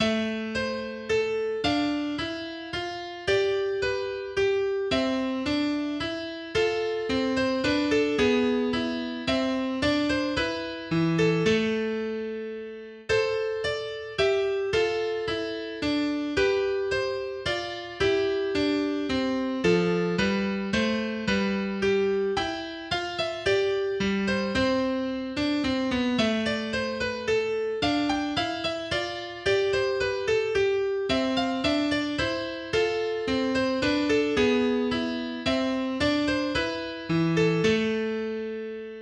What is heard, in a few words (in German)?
Menuett in A Moll, mehrstimmige Gitarrenstücke; Krieger